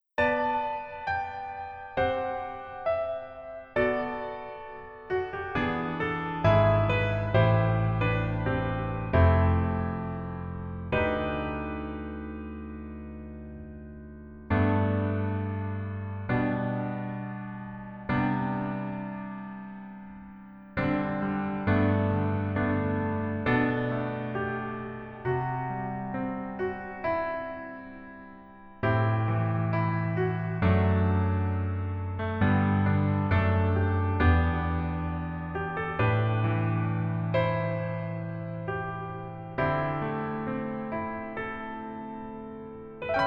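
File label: Piano version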